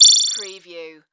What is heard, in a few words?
Multimedia Beep